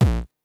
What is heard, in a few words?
dist kick; foxdot default